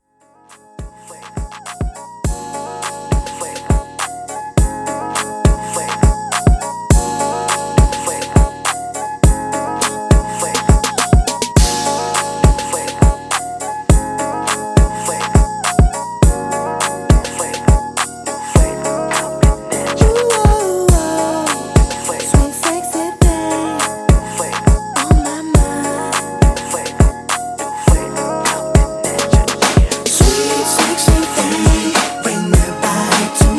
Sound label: Extended Redrum 103 Bpm